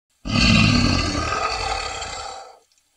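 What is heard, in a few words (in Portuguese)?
efeito sonoro suspiração de monstro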